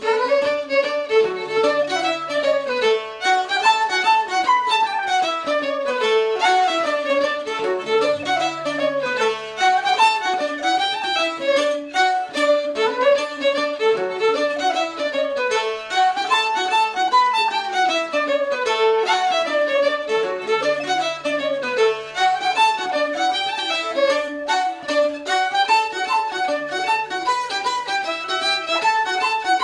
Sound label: Orchestra Ambience; Assassin's Creed III